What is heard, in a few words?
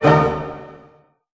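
A major pianissimo, orchestra hits; Sound On Sound - Peter Siedleczek's Orchestra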